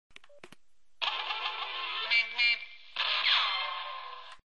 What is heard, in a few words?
meep meep